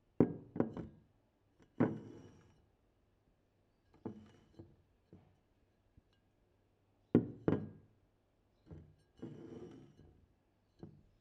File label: Glass bottle On Wooden Table